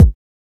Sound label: old school wet bass drum e key; kick drum samples